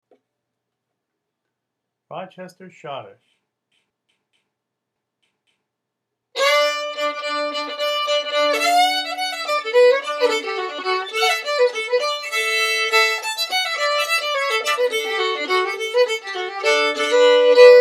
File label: Schottisch, fiddle; Rochester